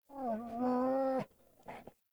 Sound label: Wolf Idle Friendly Moan; Animals; Vocals